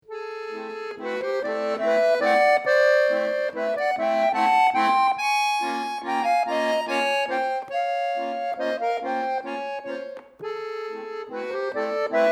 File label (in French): musette miniature valse